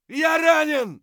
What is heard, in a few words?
hurt light; Escape from Tarkov